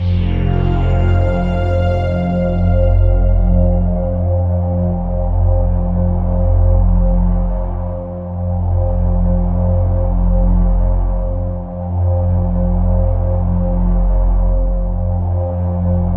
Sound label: Tense; Serious Sam